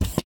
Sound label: gear, combine soldier; npc